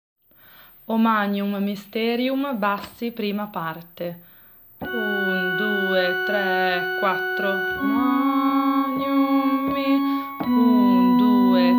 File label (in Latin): O Magnum Mysterium bassi